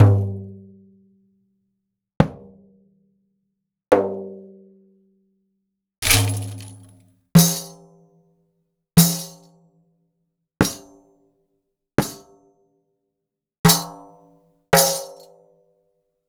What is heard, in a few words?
Raw Perc Daf